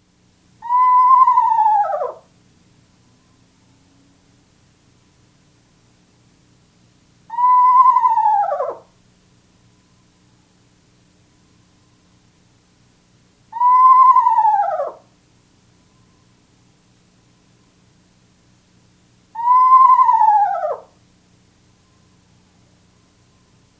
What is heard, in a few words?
Eastern screech owl call